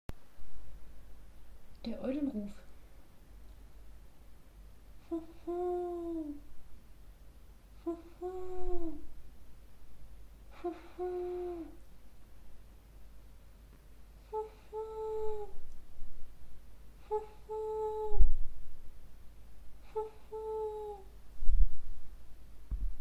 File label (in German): Kopfstimme Der Eulenruf; Kopfstimme finden